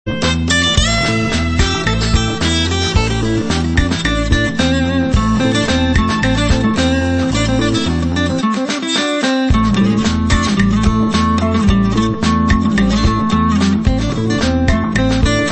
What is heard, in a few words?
acoustic Jam; Bruce Lee